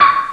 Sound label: unactive